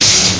bash it; thexder

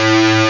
Scratch Bass A2

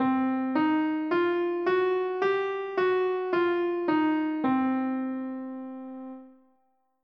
blues

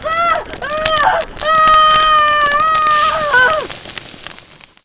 female flame death; kingpin

